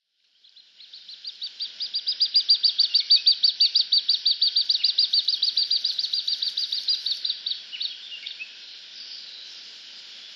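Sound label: kaitsuburi, Little Grebe; tochigi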